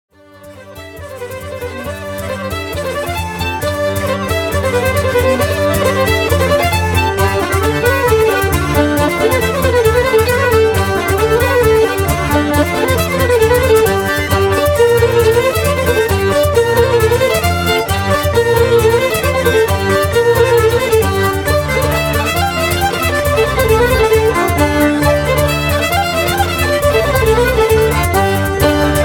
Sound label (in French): Polka des cadurques